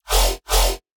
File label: Dubstep Layer Bass